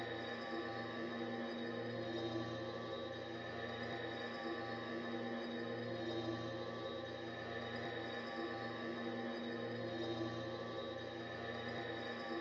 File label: halo gun idle, weapons